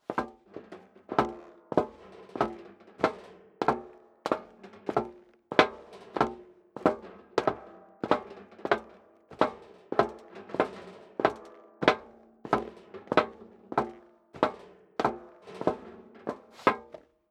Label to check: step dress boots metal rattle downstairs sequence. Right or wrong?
Right